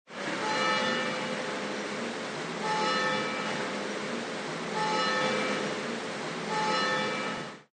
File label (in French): cloche loin